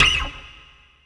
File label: Kawai K5000W Fret Noise